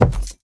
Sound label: Footsteps Wood Run; The Town Sam; Devour 2021 gamerip pc macos